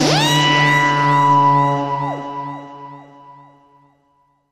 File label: Sharp Shocker Transition